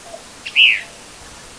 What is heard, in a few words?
Tyrannus vociferans